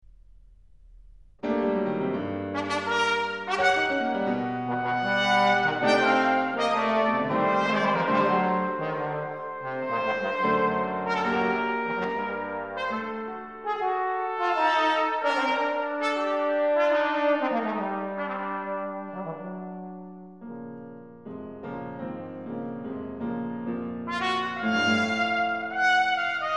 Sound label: Trio for trumpet trombone and piano